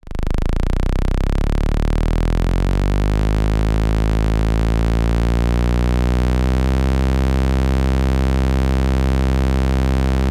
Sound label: Erica Synths Liquid Sky Dada Noise System 2 Tube OSC Roh